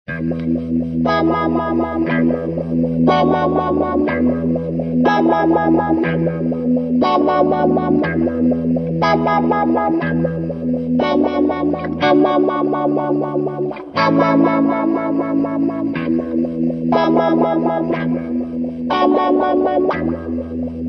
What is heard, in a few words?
clean